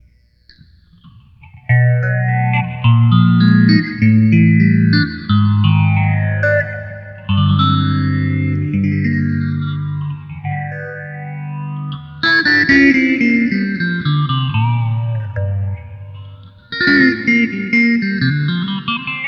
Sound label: Slo Bi Phaser, Boss GT-8